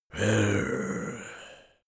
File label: zombie moan idle